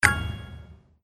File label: PICK SHUFFLE; Monkey Spin